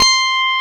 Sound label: Korg CX3, C 1